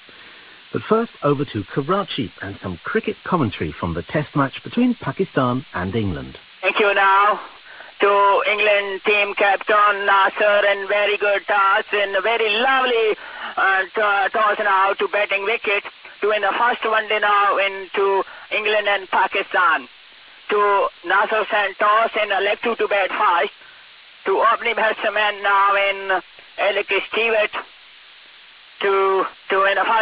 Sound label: cricket